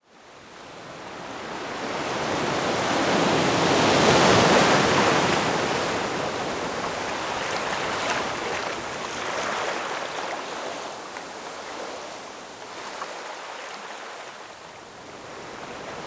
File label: water ocean waves ambience nature slow surf